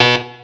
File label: alarm